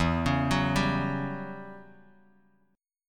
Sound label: E Minor 6th Add 9th guitar chord, Open D